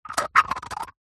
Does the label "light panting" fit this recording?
No